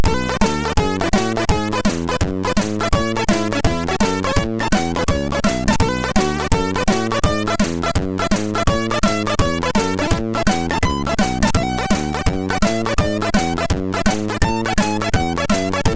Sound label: Synthetic Jazz sid MOS6581R2; Lindanger Trond